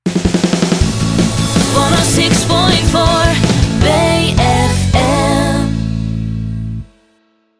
Jingle Sting

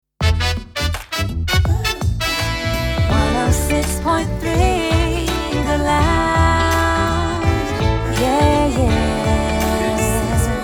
Jingle Slogan; 106.3 The Lounge

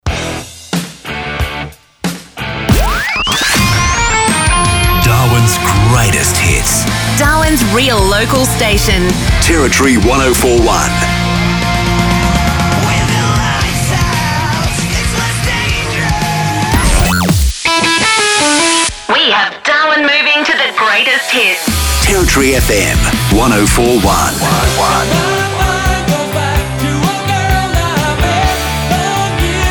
Sonic Imaging Montage; Territory FM

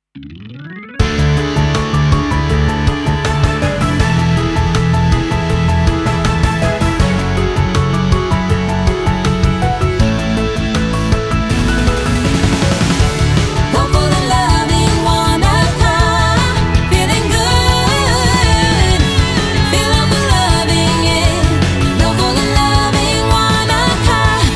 Jingle Ramp; Radio Wanaka

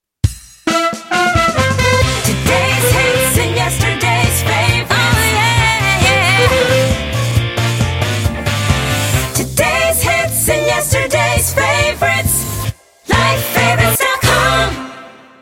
Jingle Slogan 134bpm

